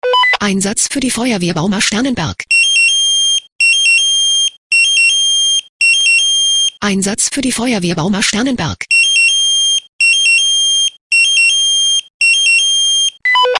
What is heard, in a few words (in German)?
SMS Alarmierungstone; FW Bauma